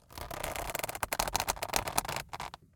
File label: leather glove creaks